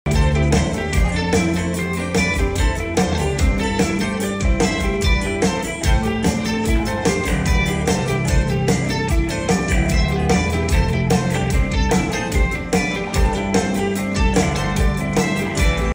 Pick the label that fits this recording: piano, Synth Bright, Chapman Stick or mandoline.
Chapman Stick